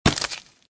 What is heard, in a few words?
Saw death